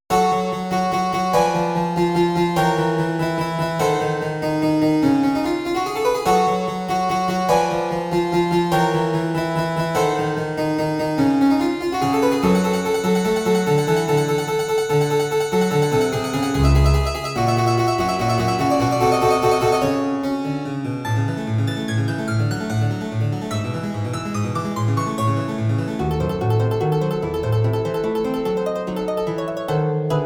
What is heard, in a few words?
English Harpsichord; Mozart aus Londoner Skizzenbuch; g moll